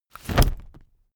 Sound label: umbrella telescopic put up fast